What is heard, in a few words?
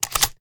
empty start fast sideload, sh; reloads; iw8; romeo870; gmod